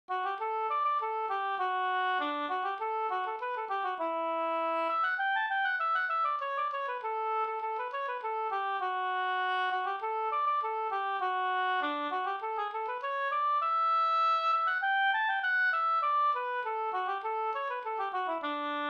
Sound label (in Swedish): ganglat D dur; Vastergyllen av Yngve Laurell